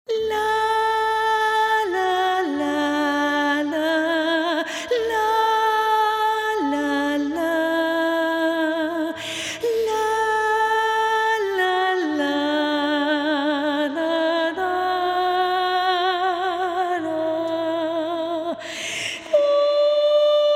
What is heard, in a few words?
solo voice